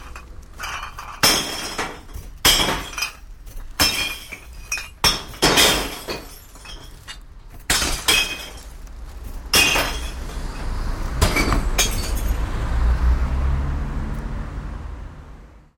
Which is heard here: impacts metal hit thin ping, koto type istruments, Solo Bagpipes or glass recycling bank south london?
glass recycling bank south london